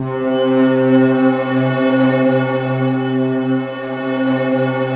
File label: BELLPAD C2, Yamaha VP-1; Music Store; 90's Sample CDs